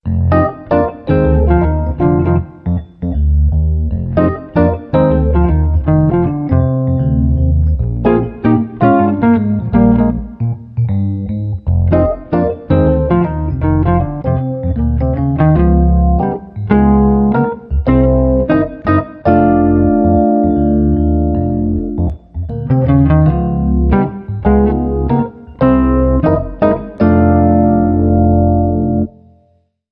Bouncin Blues

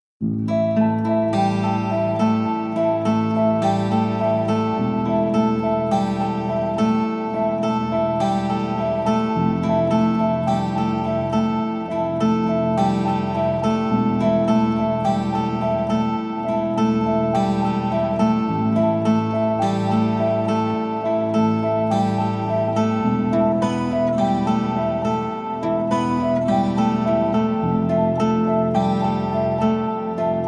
Only Theme and Low Bass

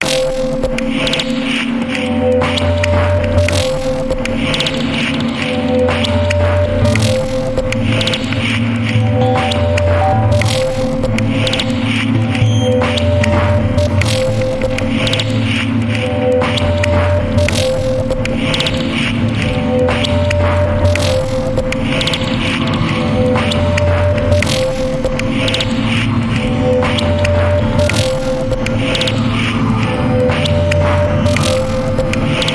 Ambient

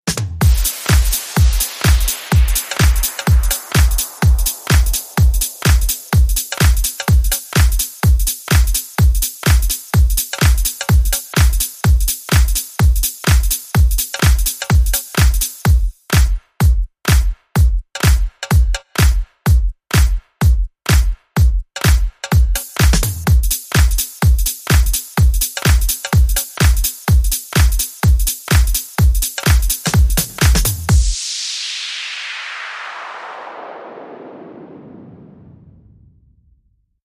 Sleeve Beat

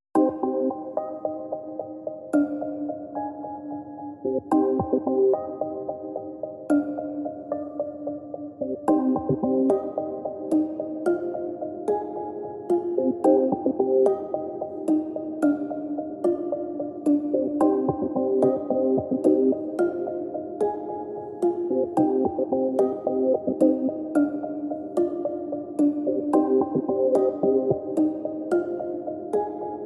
Synths

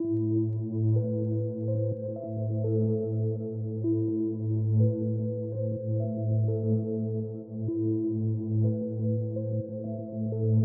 No Drums Lead Melody

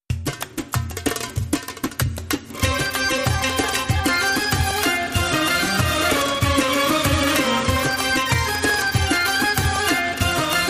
Arabesk

